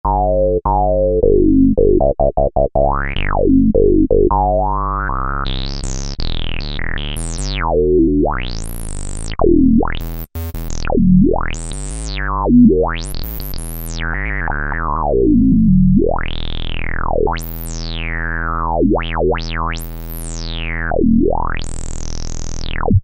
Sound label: Acid Square Bass, Korg microKORG; Factory Voices